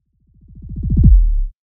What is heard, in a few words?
FX